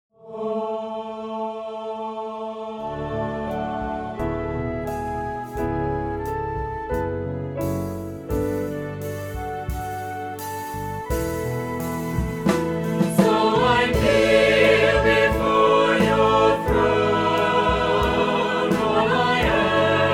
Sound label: SATB Vocal Audition